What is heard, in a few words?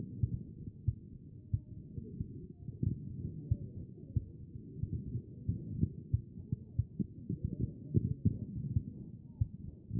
A CHEST; B MODE